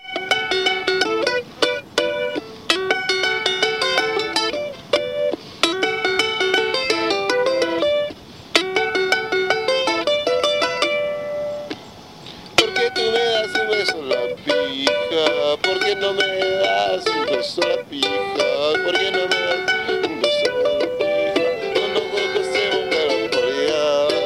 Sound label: charango